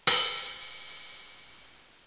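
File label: Cymbal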